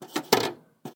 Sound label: VHS Handling; SoundsCrate